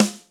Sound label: smooth acoustic snare sound g sharp key; snare drum samples